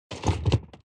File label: Rolling